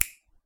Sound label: snap